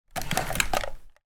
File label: household hairdryer remove from holder hotel